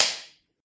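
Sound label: sounds of a high five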